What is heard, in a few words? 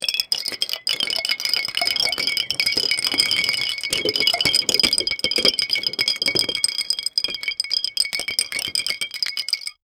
a glass more than filled; sprinkle